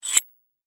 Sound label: Bolt Slide Open, Industry, Bolt, Slide, Open, Metal; Machinery; Machines; BlastWave